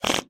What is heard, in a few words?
Fart; Lethal Company